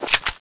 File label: bolt pull, duck gun; tfa cso